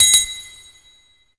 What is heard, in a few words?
DRMS, Pure Electro; USB Soundscan vol.03; AKAI